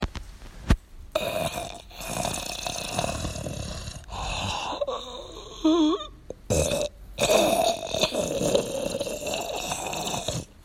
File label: car